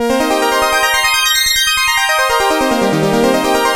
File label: Korg, FX